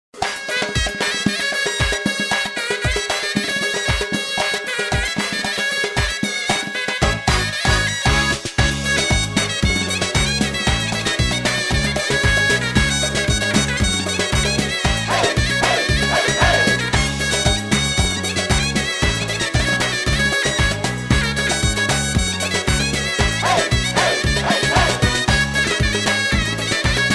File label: Kochari contemp music